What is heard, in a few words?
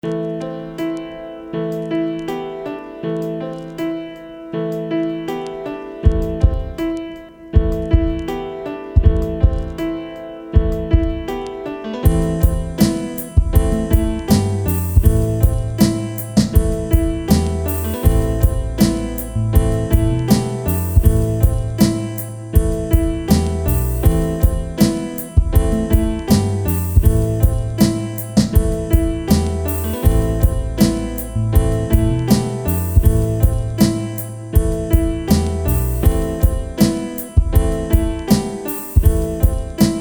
Piano Mix